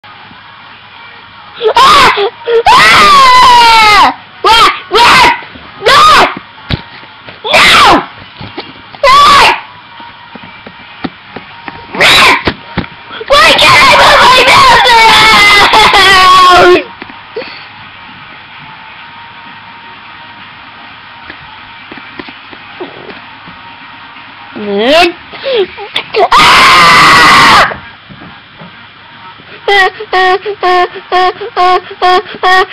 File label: Boy Turns Blue and Screams